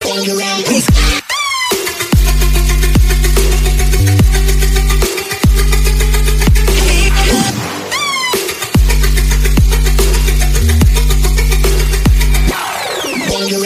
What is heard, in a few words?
Remix